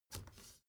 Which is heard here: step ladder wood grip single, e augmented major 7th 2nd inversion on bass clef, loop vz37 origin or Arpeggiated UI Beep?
step ladder wood grip single